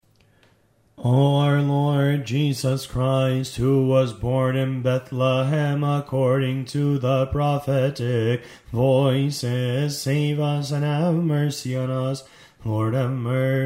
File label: concluding canon, hymns